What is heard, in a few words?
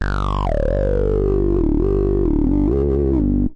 135 two note filterbass line